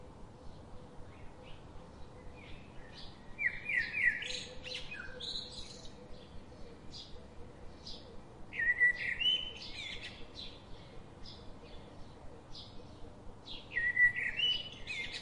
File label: birds sfx